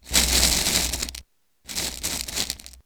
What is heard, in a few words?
gravel